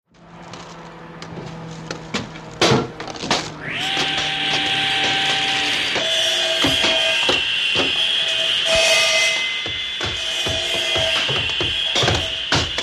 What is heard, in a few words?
CONSTRUCTION & FACTORY Background RESIDENTIAL: INT: Indoor construction, busy distant hammering, circular sawing, prying at wood.